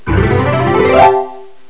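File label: honky tonk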